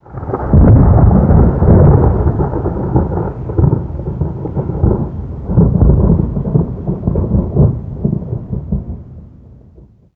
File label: thunder dist; amb elements; modern warfare 2